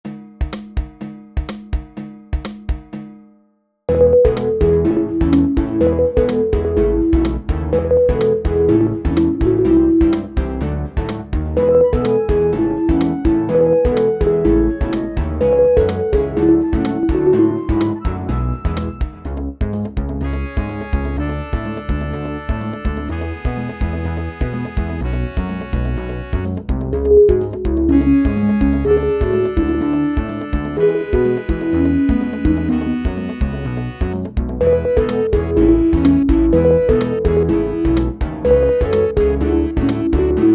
Rainforest - Main Channel; Newer Super Mario Bros. U; Wii

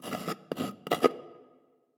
Scratch Insignia IN; Prison Architect; DLC GANGS

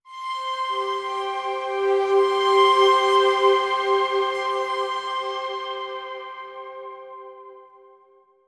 Synth C3; torchlight iii; ps4

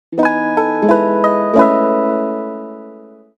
Music Menu event

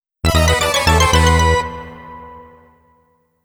Player Miss (MIDI Sound Source 〔CM-64〕 Version); akumajo dracula kuro 2021

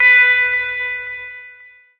Balloon Floating

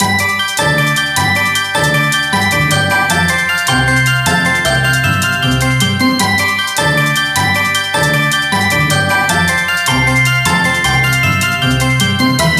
Princess, reveal music

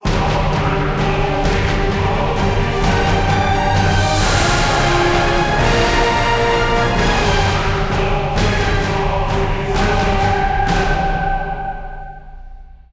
Ascension Sting; Tempo Synced

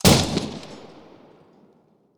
Machine Gun Shot; blastmorph pinball; xbox series xs

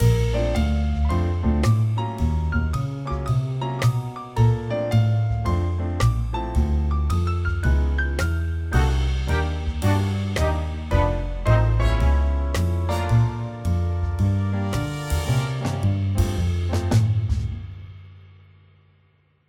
Swing Melody; Tintin Reporter: Cigars of the Pharaoh

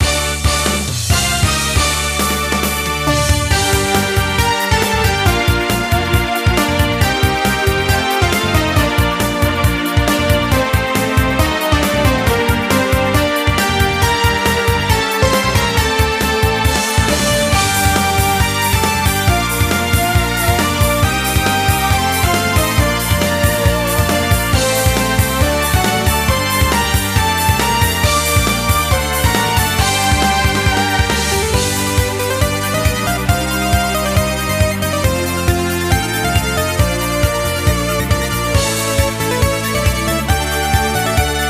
Music Menu event

